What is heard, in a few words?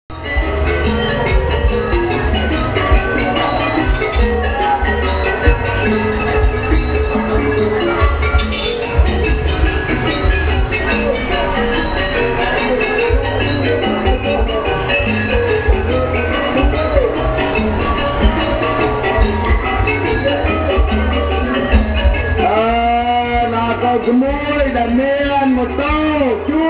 gamelan, Gamelan Swing Music; thai cambodia